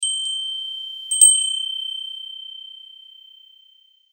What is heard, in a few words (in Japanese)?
風鈴